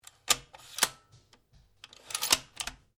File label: RIFLE REMMINGTON700 7.62MM BOLT ACTION CLOSE ROUND CHAMBERED; A selection of domesttic firearms; Rifles